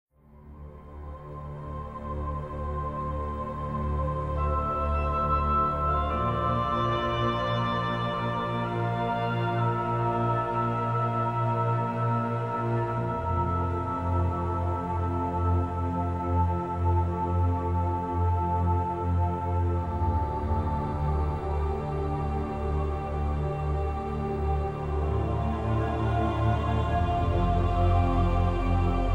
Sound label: theme ghost; Myst III Exile; XBOX